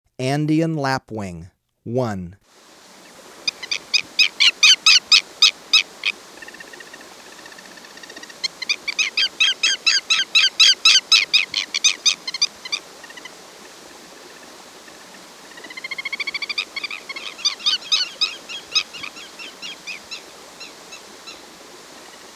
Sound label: Andean Lapwing; S. America